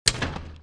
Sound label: target fall; Rapid Gun; ipsc